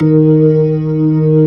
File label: LOW SLOW D#3, ToneWheel Organ; E-MU Formula 4000 Series Vol. 3; Analog Odyssey